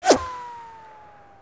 whizz; Mafie 1

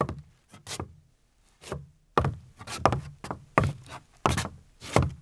steps; Mafie 1 cz

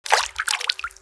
water; Mafie 1

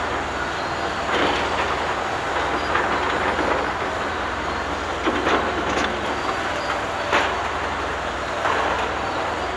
build; Mafie 1

